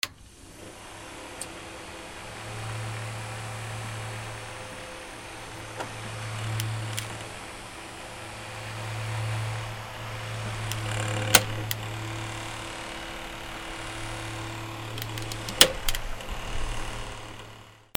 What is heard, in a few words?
BOATS